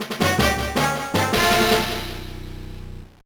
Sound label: Jingle; rebelstar tactical command 2005 gba